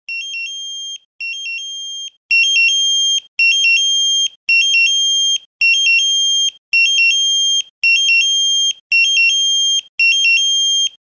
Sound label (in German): SMS Alarmierungstone; Swissphone